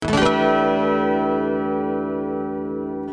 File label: twelve string strum